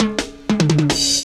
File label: Fills, Roland; Sound & Vision - Gigapack I; Perc